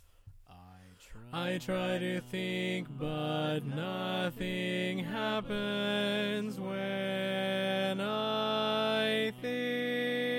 Bari, barbershop tags

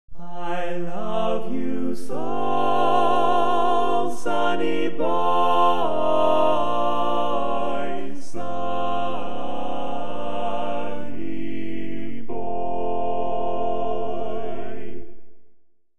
Tenor

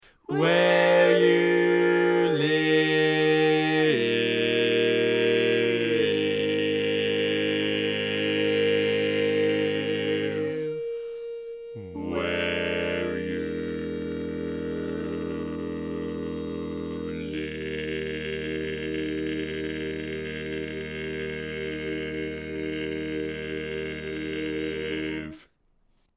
Bass, barbershop tags

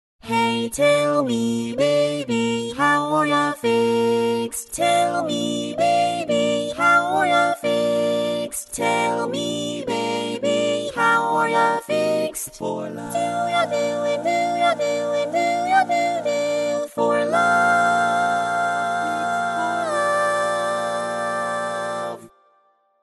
Tenor, barbershop

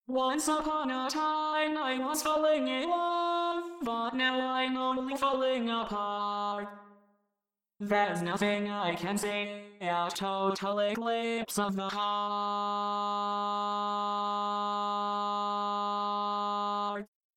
barbershop tags

